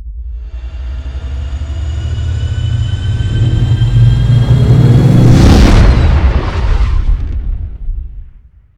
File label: hyperspace begin, Shuttle; civ13; civ14